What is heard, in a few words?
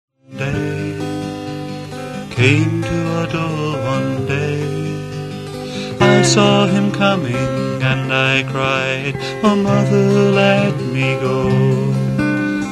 violin